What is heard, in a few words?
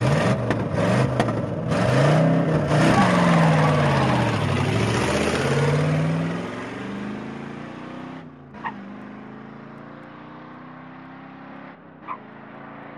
Car Away Loud Chuggin